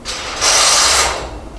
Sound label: metal